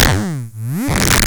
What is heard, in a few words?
Vactrol Trouble; Cwejman S1; DevSnd Cwejman S1 - JK